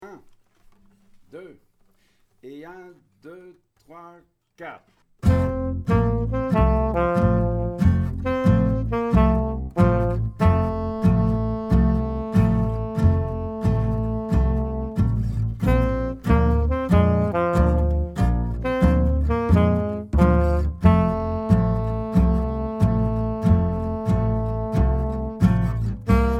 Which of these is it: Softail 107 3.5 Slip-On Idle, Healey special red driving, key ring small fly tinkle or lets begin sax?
lets begin sax